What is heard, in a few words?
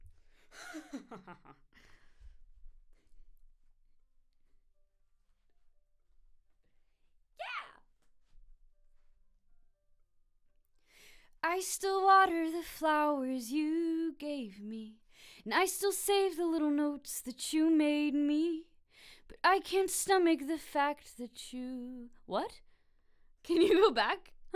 Vox